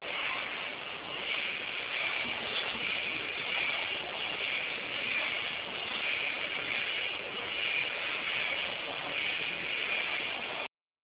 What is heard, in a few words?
front low, worm